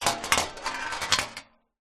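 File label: leisure marble roll on metal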